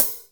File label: HIHATS, LITE DYN